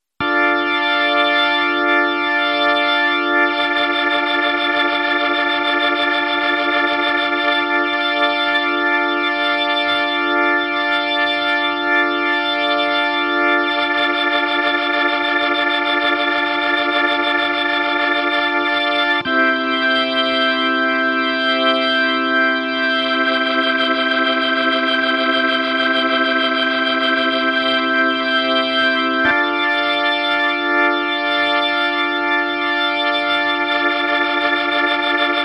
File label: guitar orgue